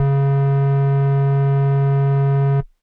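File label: Air Bass Kawaii C#1; keys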